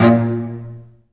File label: cello